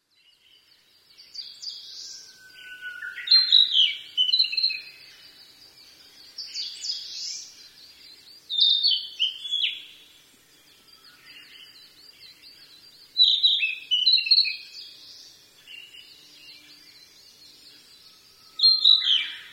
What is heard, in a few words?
kibitaki; キビタキ; 2018年4月28日 静岡県富士宮市